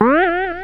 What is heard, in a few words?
spring jump; superbroswar